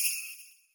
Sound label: BELL